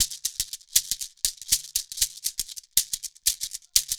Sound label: 120 African Shekere; World; African Percussion